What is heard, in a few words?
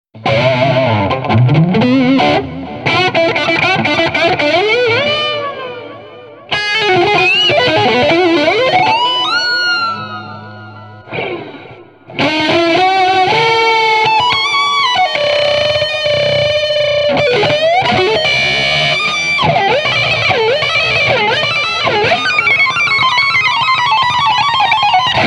rawsolo